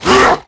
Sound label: attack grunt, zombie; creatures